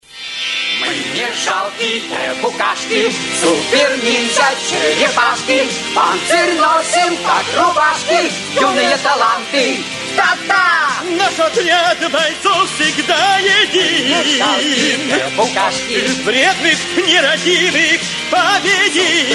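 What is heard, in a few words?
theme song Russian; turtles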